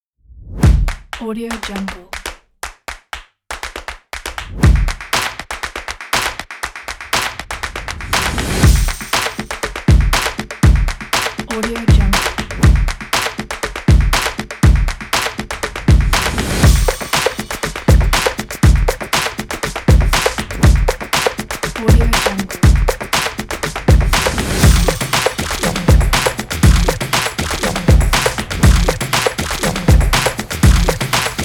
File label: modern stomp claps; audiostory